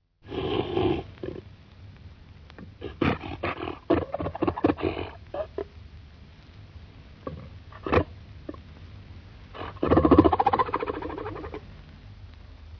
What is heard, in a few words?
harp seal